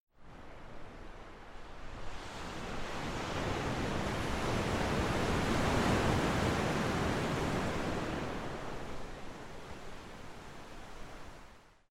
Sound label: sea; Slugocalypse